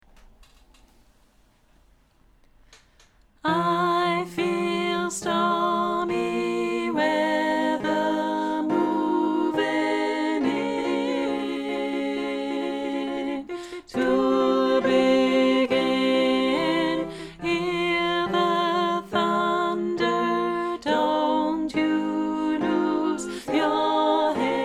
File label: Bass, Singalongs